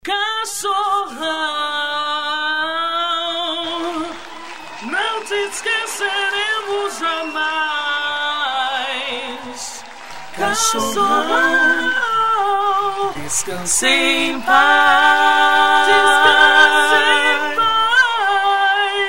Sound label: acapella